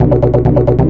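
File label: acidtoner, 135 bpm; SYNTH AND ELECTRONIC LOOPS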